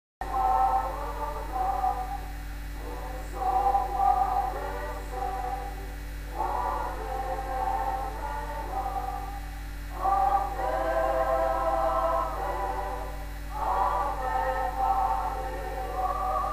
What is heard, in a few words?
sotho hymn